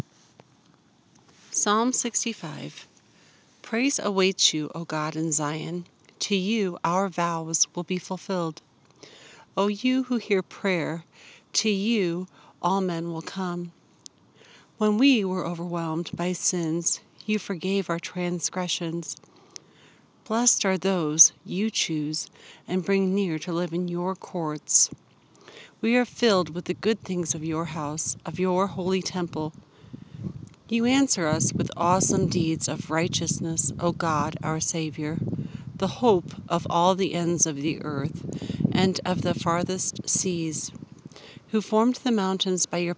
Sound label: Sounds of Moraine Hills; Psalm 65